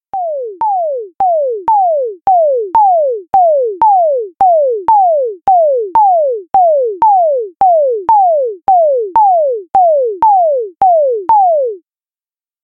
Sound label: Alarm clock beeps